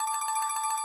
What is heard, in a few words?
win